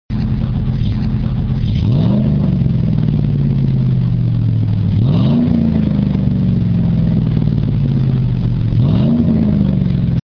2500 HD 6.0L Single

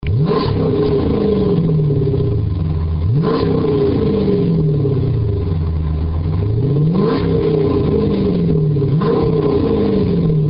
Challenger SRT8 6.1L Dual

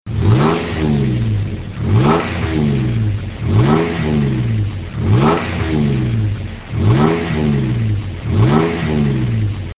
Frontier 3.3L Single

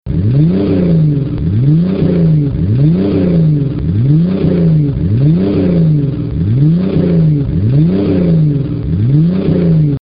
Wrangler 4.0L Swept Side

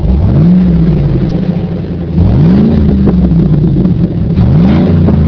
hummer h2 6.0l single 3.5 inch

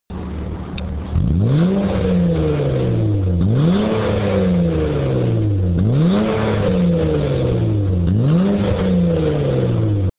Ridgeline (fly by) 3.5L Single

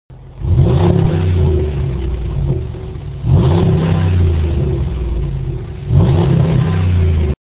Commander (fly by) 5.7L Swept Side

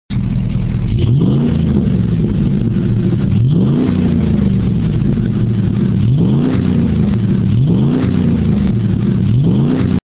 Escalade 6.2L Single

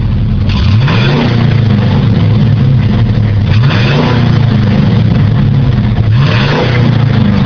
gmc yukon 6.0l single; Chevy/GMC

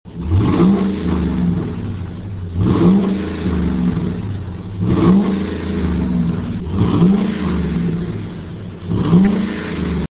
F-250 V10 6.8L Split Rear

